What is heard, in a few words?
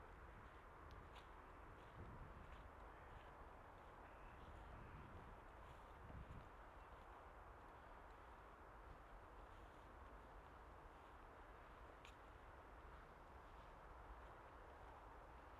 park, scenes; IEEE AASP